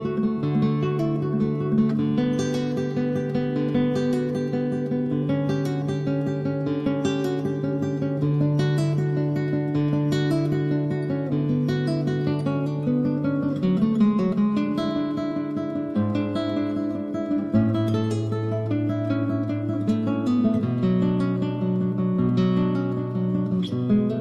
Cello Suite No. 1- Prelude; Bach, Johann Sebastian; arr. Michael Lorimer for guit

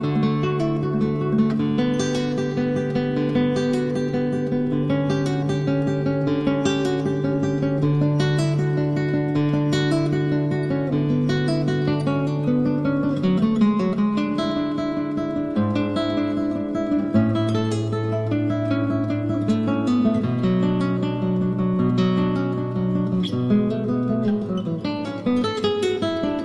Cello Suite No. 1- Prelude; Bach, Johann Sebastian; arr. Michael Lorimer for guit

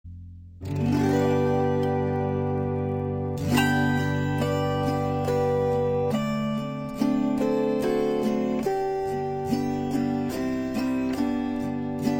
Hawaiian Slack Key Guitar, Silent Night

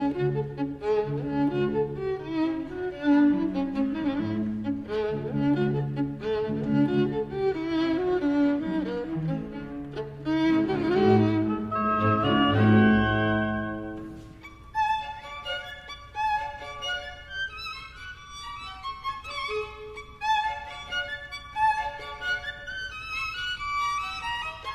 Violin Concerto, Baroque Orchestra

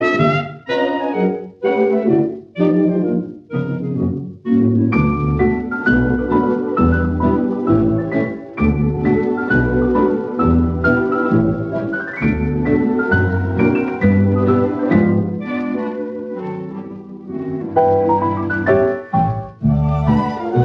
Jazz Legato

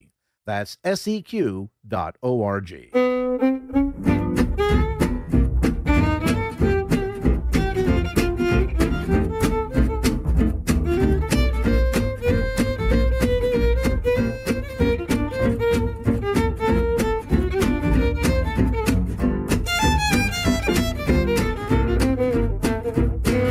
Gypsy Jazz Swing Quartet

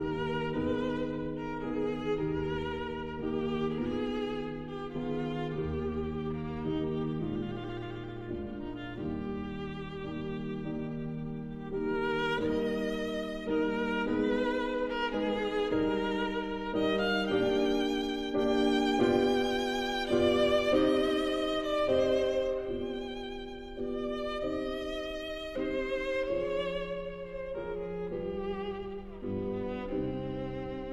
Sicilienne for violin and piano

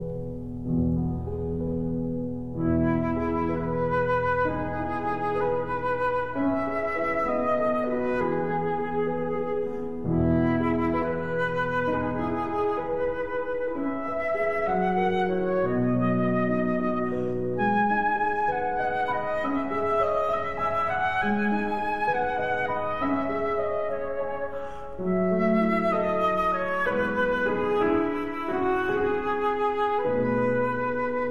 Berceuse (Lullaby) for flute and piano; Gaubert, Philippe

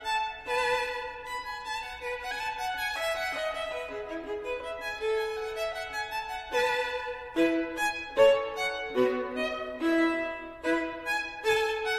Gavotte en rondeau, Violin

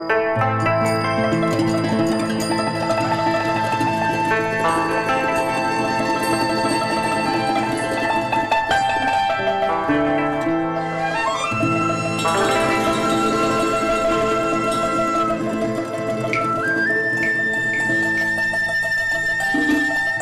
TRADITIONAL Chinese, Picking Flowers

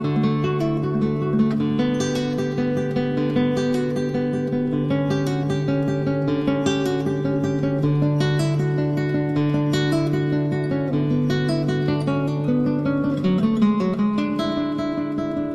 Cello Suite No. 1- Prelude

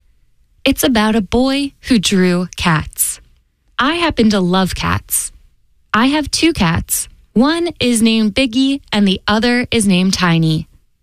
baritone - Cat Duet

